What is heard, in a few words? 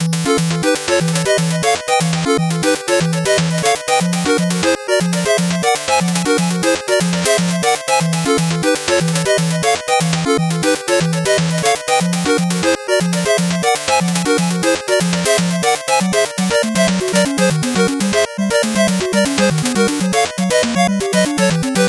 8bit bgm normal